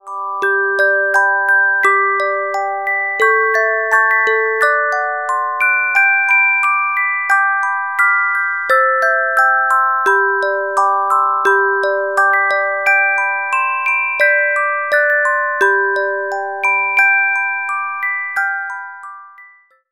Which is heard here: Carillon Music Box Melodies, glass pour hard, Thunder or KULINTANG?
Carillon Music Box Melodies